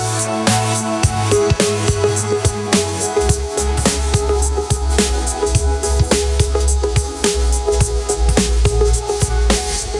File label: musicgen Test